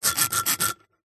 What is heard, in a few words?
foley stone or flat rock knife blade scrapes scratches fast; zapsplat